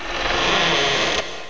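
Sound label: door creak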